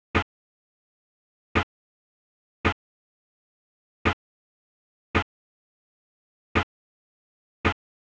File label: Drum Loop - 96 BPM Percussion, Latin; Cymatics; PARADOX II; Drum Loop Collection; Campari